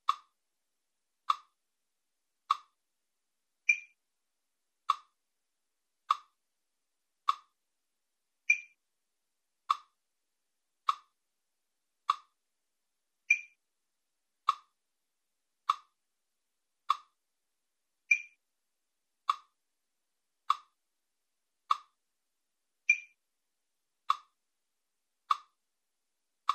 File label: metronome steinway